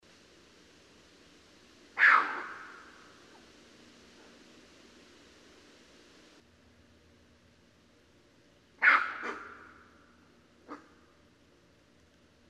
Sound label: Raccoon; Northern Raccoon (Procyon lotor)